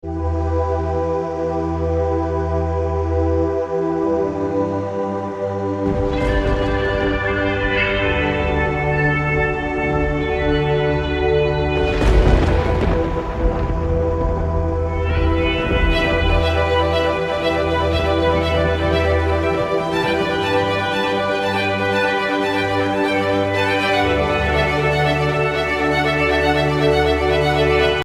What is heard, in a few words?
serie beat